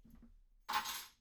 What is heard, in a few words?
keys; IEEE AASP